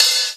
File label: OP Hihat; Open Hihats